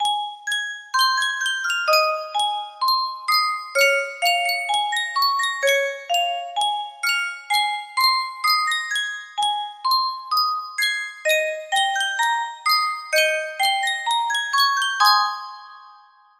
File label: Yunsheng custom tune music box